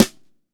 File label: sonor snare